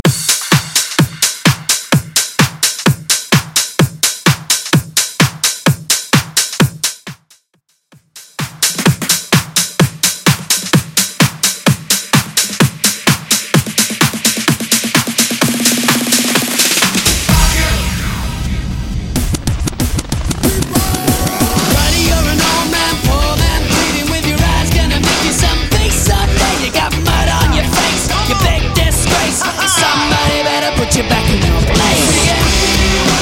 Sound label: EDM Party Club Mix